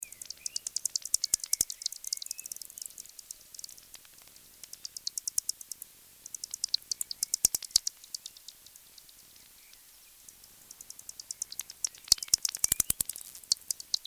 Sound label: Bats echolocation; Yannick Dauby